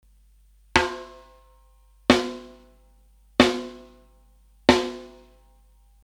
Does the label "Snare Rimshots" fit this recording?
Yes